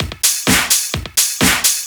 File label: Straight Loop 128 BPM, House